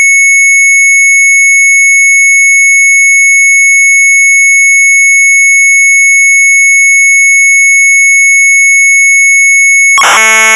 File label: MZ800 Tape; sharp; UFO-MIJNENVEGER; retromelsarchive